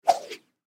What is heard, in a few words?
Wire Whoosh, Wire Single Whoosh; Whip Sound Effects; Foley; Objects; Blastwave